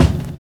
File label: 60 S BEAT; Univers Sons - Kitch 70s